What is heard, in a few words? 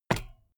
bmx bike pedal grip single